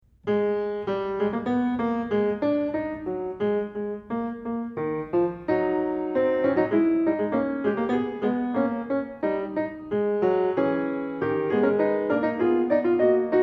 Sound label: Prelude and Fugue No 18 in G-sharp minor, Clavier; Johann Sebastian Bach; Well-Tempered Clavier